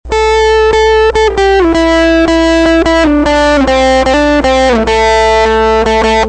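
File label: Harmonic Jerkulator, guitar; Salvo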